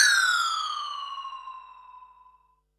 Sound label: Perc, Percussion and FX; Pearl SC-40